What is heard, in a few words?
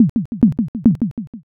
slot stop